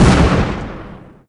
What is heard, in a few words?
the bouncing betty shoot low